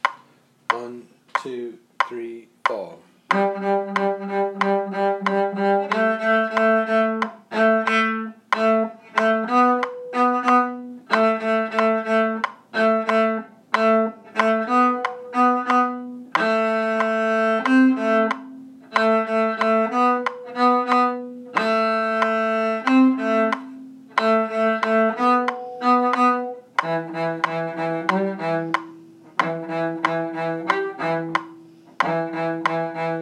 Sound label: explosion violin